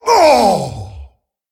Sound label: Male Tol'vir Death